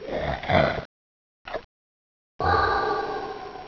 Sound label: rex eat